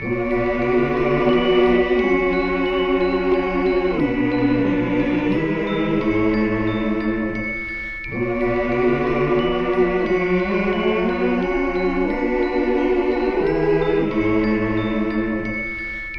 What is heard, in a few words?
voice bittersweet; Loops; Sad